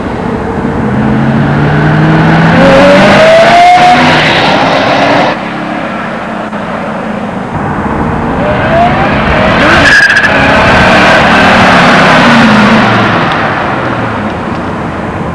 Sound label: Cobra Drive By